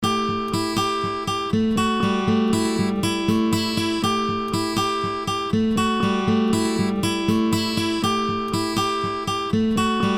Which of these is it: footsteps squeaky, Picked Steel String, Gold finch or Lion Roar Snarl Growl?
Picked Steel String